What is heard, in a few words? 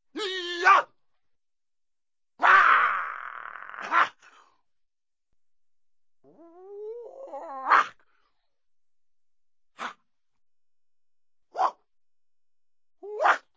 Yelling Male Karate Action